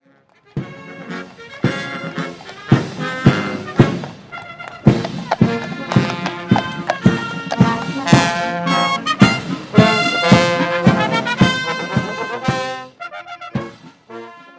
marching band playing ambience background sound effect